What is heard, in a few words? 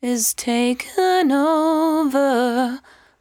74-5bpm B, Velvet Vocal; While U Could; Zero-G